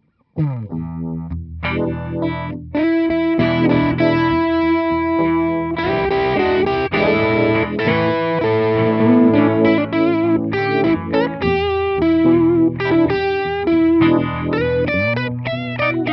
Blues Deluxe1 Overdrive, guitar, Blues – Deluxe1 – SHOD Overdrive – UV Vibe; ReTube Custom Shop